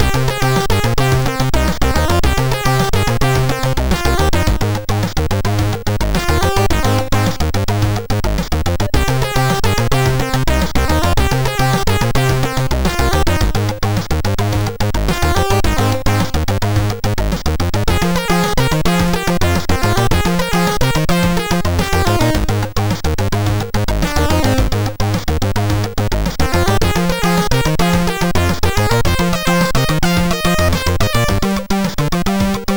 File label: Driver Test, MOS6581